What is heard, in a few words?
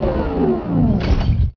door close